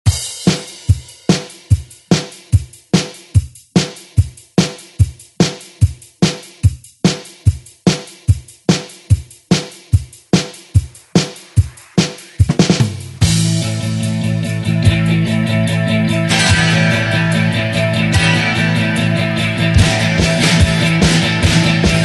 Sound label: 80s Re Drum; DJ Mhark